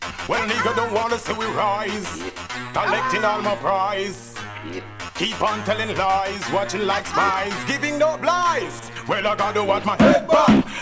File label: scream riddim